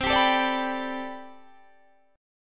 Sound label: A Minor 11th C, Chord